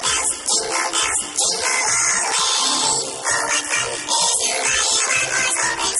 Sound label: Electric Jingle Jing